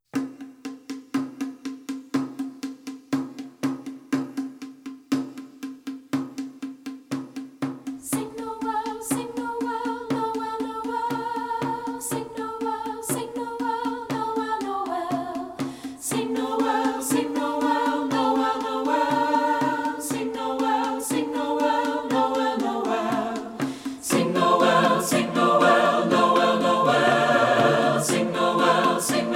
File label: chorale full choir; all parts; recordings for rehearsal